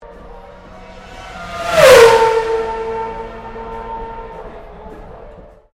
Car racing F1 passing beneath bridge, F1 Grand Prix practise; Cars; Vehicles